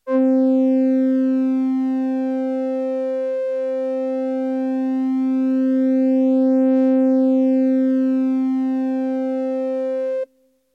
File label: Diaps Stereo